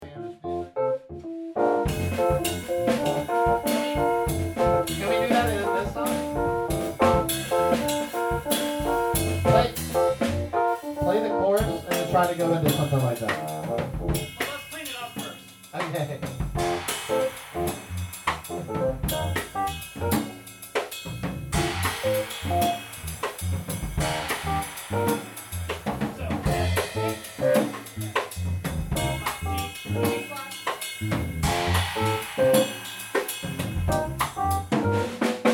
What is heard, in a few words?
Good Samba